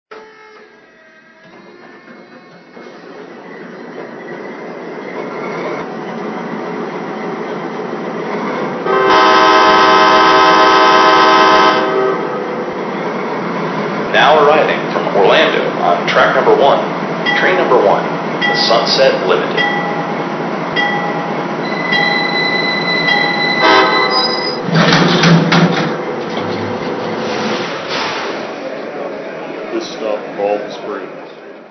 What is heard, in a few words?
GE Dash-8-40BW